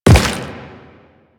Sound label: Step Rock, Footsteps; Warframe; Quests; The New War; Kahl